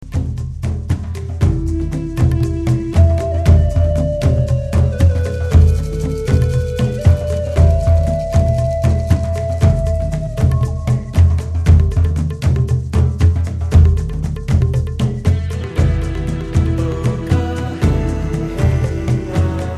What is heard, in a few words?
Sacred Drum